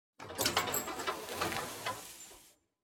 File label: Blacksmith Hammer